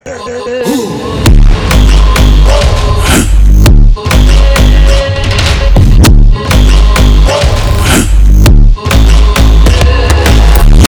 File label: cinematic funk